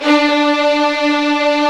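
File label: strings, Vlns 8 fff Agitato; String Master Series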